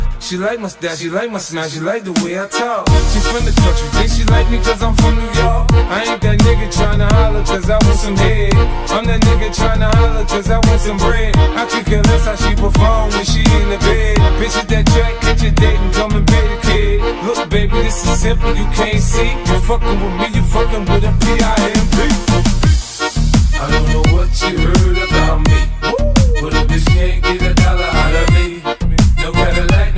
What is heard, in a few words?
Accordion Remix